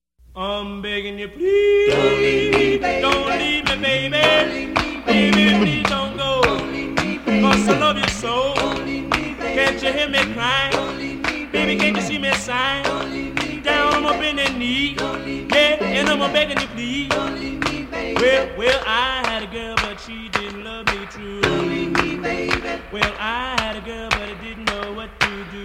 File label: doowop sounds; 1950's